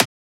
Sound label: Transient; FLPRO